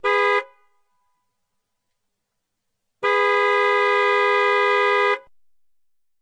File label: car horn